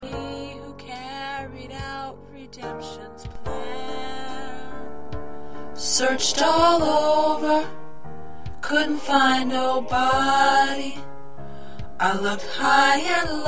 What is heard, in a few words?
Alto, Practice tracks; gospel songs